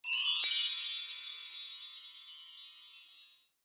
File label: Relaxed Star Chime, BlackBerry; OS-4.6